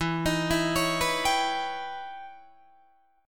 E Minor Major 13th E Guitar DADGAD; EmM13; arpeggiated; 14,18,14,18,14,17

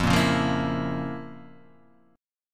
F Major 7th Suspended 4th Sharp 5th F

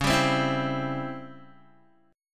Db Minor Major 7th Flat 5th Db Guitar, chord; DbmM7b5 strummed; JGuitar

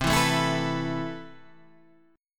C Major 7th Add 6th C

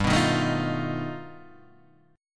G Augmented 9th, Guitar, Standard; G+9 strummed; JGuitar

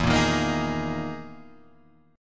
E Major 7th Flat 5th

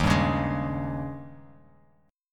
D sharp 11th D sharp, Bass Standard; strummed; 11,10,11,13; JGuitar

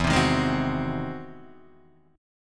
E Augmented Major 9th; strummed; E+M9; JGuitar

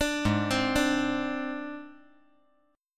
Ab Major Flat 5th Ab

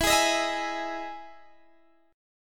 E Major 7th E down Guitar DADGAD

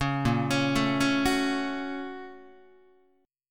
A sharp Minor Major 7th Sharp 5th A sharp Guitar; A#mM7#5 arpeggiated; JGuitar

